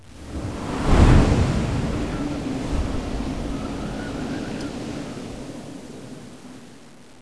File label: skydive; cstrike; pubg